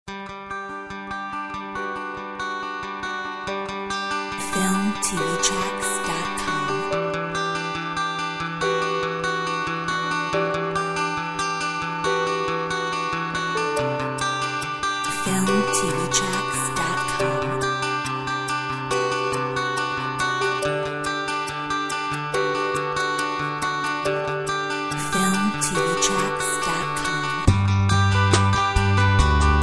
Harmonic Folk

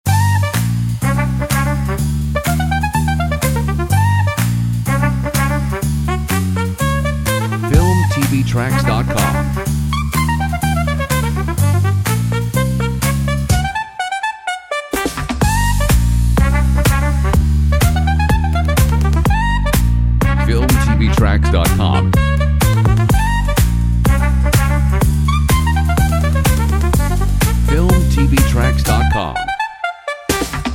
Trumpet

